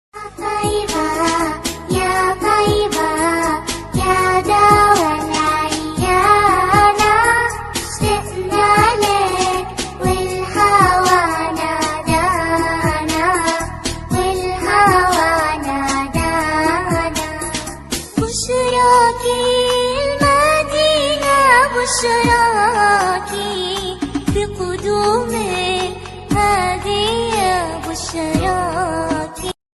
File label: kids arabic ringtone